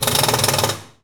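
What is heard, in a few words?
Impact small, air tools, industrial; Air toolks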